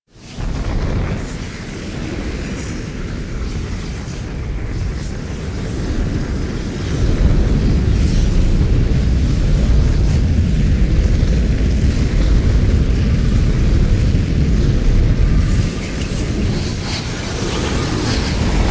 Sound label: Throne Room Blackhole; Warframe; The New War